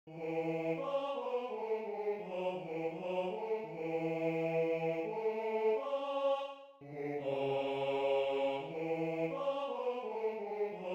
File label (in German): Bass; Jetzt fangen wir zum Singen an